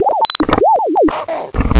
birth, gonarch; svencoop; cracklife

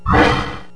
pkup, items

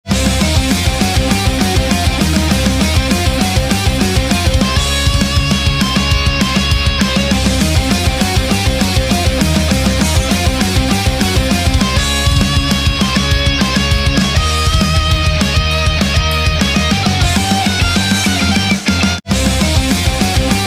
boss bgm loop; svencontra2

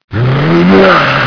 attack, zombie; mistake coop

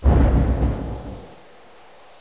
portal; svencoop; echoes; outtro

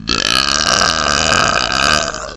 burb; svencoop; santas workshop

